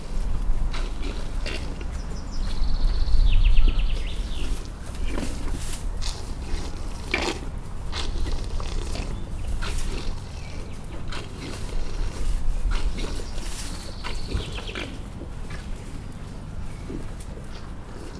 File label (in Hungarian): iszik majd vizel, amerikai bölény; pecsi zoo 2017